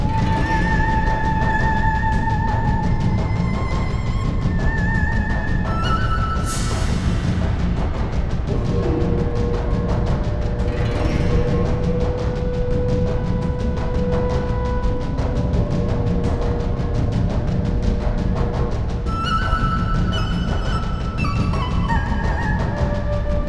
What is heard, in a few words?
attack, Music; GGJ 2017; IronToad